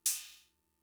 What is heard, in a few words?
Cymbal, Fricke MFB-522, Drum Machines